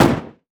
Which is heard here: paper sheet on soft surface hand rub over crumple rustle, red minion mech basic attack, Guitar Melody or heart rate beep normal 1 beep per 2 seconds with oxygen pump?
red minion mech basic attack